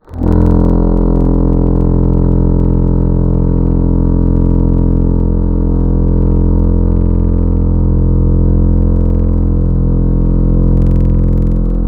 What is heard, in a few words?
Chill Sax; Instruments; Synthetic's Serum Collection; Echo Sound Works Core Tables